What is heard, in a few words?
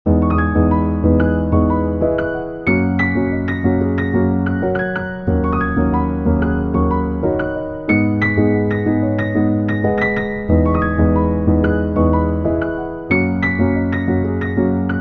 Loop Grand Piano